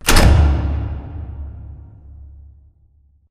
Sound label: cooling panel stop; Titanfall 2